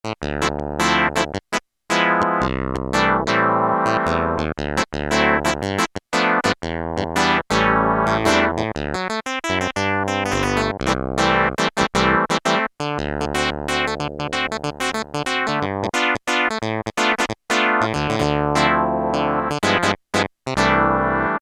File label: WAH-CLAV, Kawai SX-240